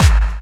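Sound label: snare drum bass drum, Dance Mega Drums; Best Service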